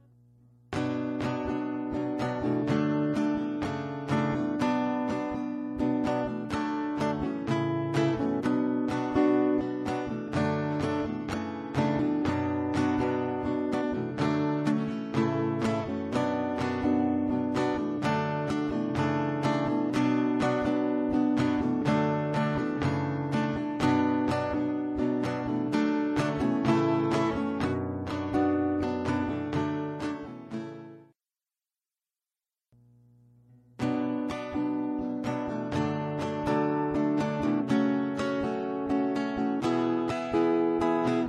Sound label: guitar chords